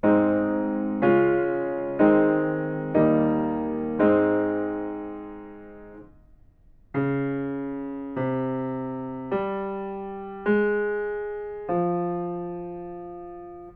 melodic fragments

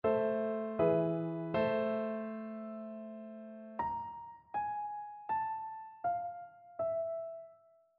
melodic fragments; ear training

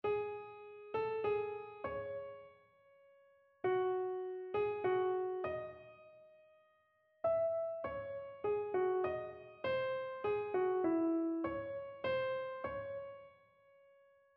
melodic error detection

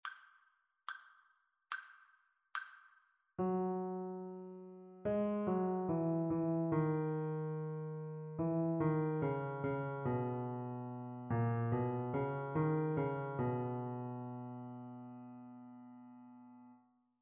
partially completed melodic dictation

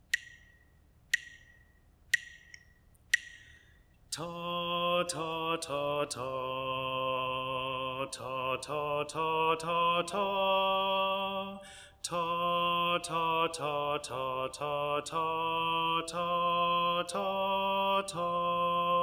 melodic dictation; eartraining

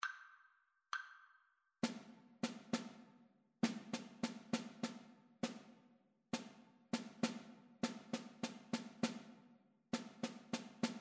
rhythmic dictation; ear training

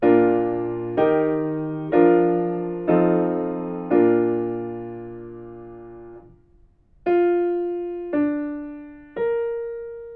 melodic fragments; ear training

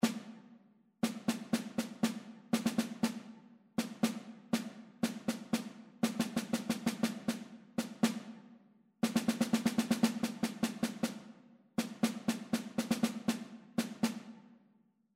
rhythmic error detection

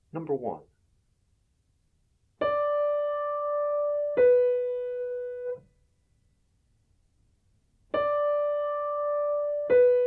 intervals; ear training

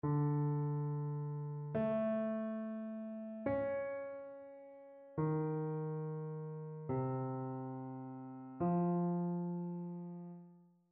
interval chains

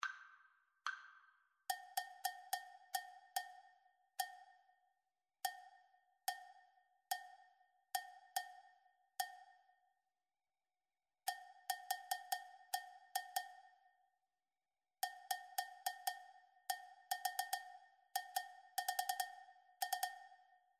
rhythmic dictation

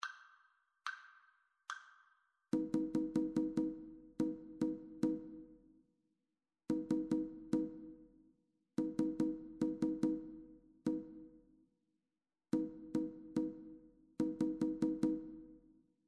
rhythmic dictation; ear training

